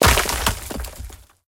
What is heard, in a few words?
labyrinth revival stage slot result fail